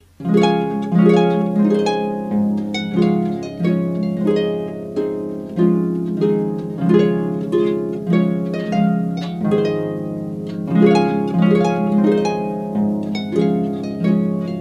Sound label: Trumpet Tune, harp